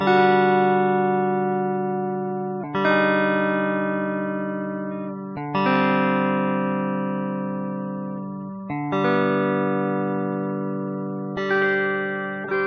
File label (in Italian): suono chitarra